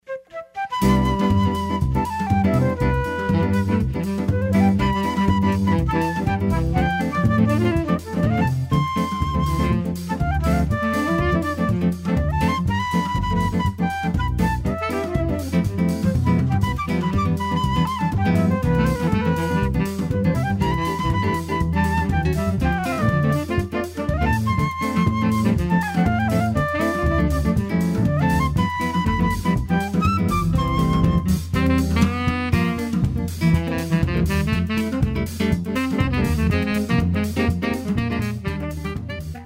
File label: Samba